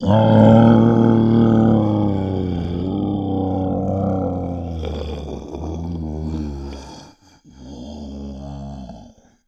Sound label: die; agrunt